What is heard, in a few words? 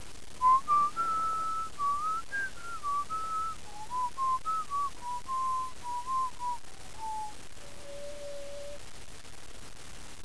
clockwork nightingale